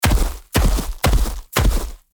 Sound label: Velociraptor dinosaur footsteps medium